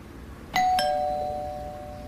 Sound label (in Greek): κουδούνι